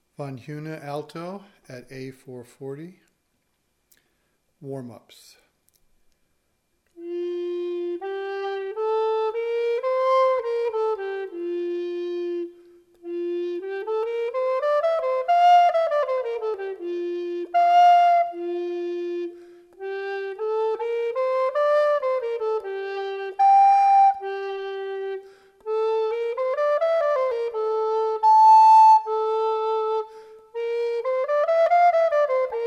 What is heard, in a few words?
Alto Warmup, recorders; VonHuene